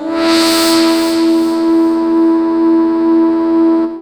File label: glass mod, korg